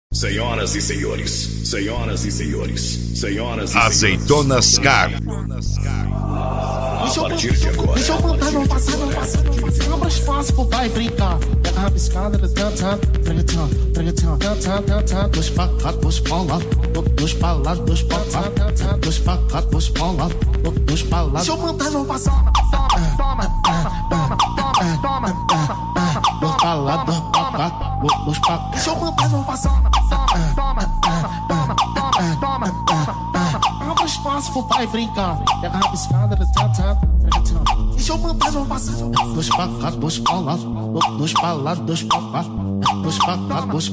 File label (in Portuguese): esp insane sound; azeitonas car; barretos